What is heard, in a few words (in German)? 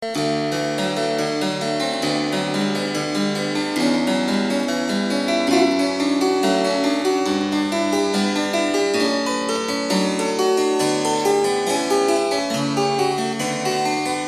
spinett